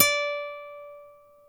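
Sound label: NYLON D 5, guitar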